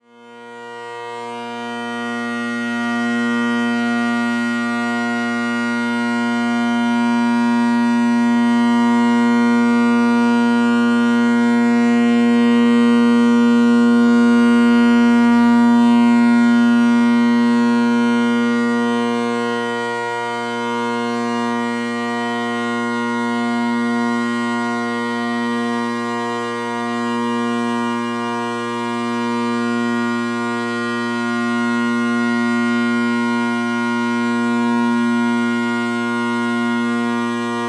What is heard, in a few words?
drones